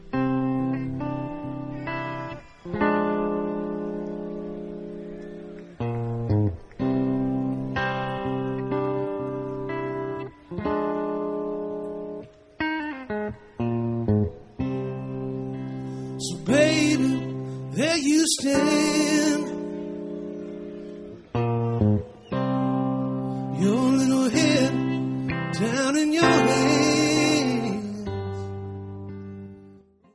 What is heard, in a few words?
jazz